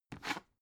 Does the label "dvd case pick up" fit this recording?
Yes